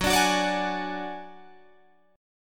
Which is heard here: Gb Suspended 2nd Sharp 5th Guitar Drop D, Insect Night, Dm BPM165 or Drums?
Gb Suspended 2nd Sharp 5th Guitar Drop D